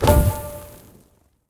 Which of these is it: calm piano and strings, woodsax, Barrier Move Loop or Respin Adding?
Respin Adding